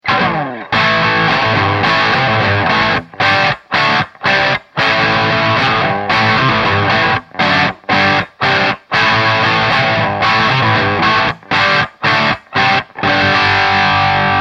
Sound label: Crunch Notch